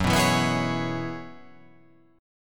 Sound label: F sharp 9th Suspended 4th F sharp, chord